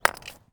break node hard; Too Many Stones

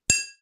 break glass; Too Many Stones

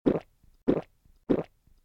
use drink; voxelands

